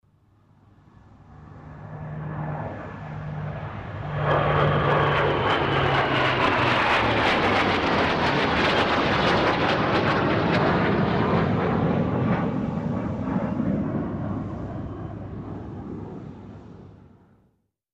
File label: Lockheed P3 Orion reconnaissance turbo-prop